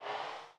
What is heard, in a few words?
Zoom Chunk; Insanely Twisted Shadow Planet